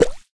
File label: click; Koby Jump Escape